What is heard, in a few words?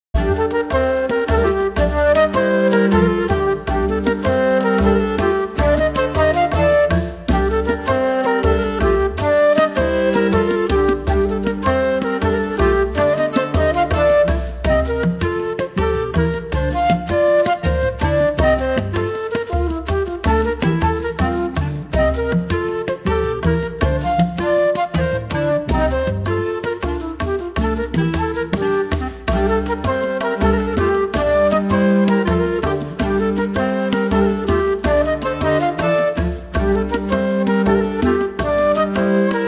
folk dance II